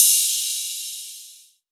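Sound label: Open hi-hat; Zero-G Trance Inducer; 90 s Sample CDs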